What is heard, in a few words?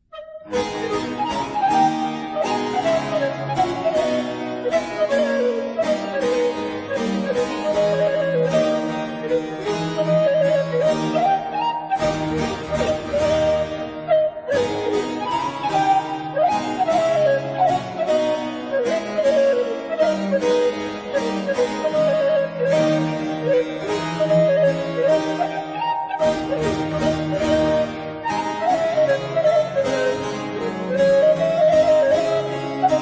Sonata in a minor Allegro spiccato; Sonata Quarta; Francesco Mancini